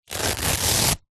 hospital blood pressure machine arm band velcro rip